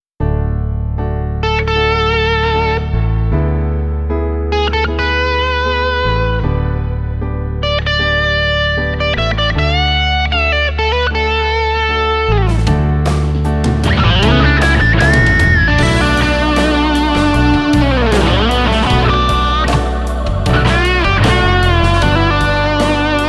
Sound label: echo; gt-001 tera